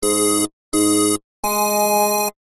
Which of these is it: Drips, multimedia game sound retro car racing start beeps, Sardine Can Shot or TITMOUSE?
multimedia game sound retro car racing start beeps